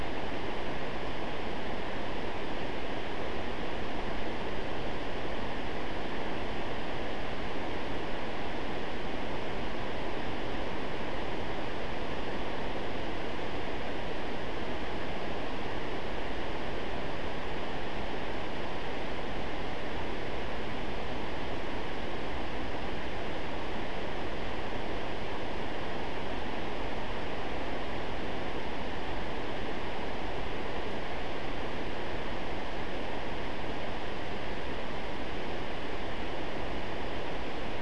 no obvious biophony

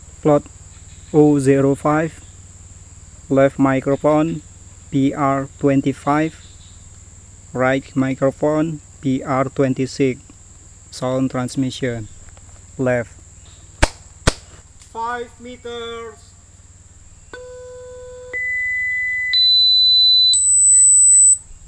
birds

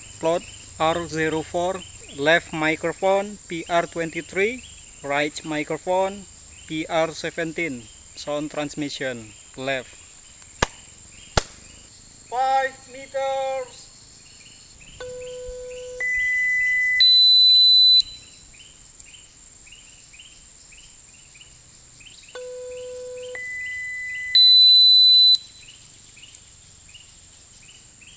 birds

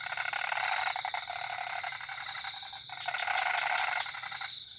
Ingerophrynus parvus; Ingerophrynus parvus (multiple)

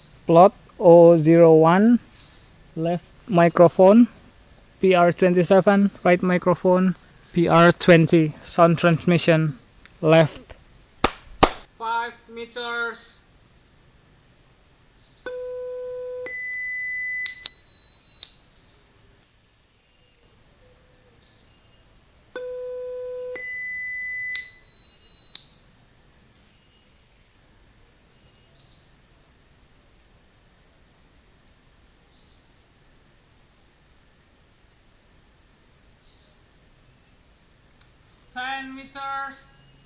birds

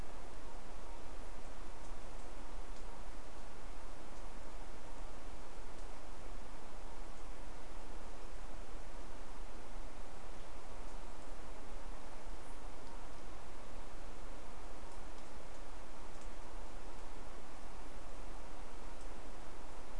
no obvious biophony

